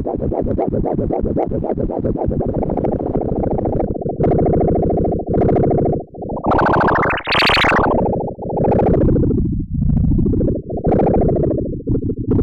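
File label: noise lowpass; makenoise spectraphon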